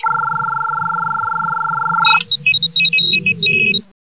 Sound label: computer bleep unlooped